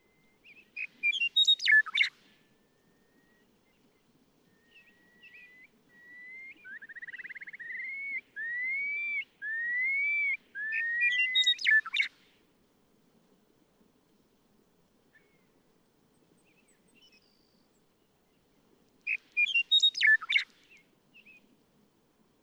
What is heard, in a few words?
Western Meadowlark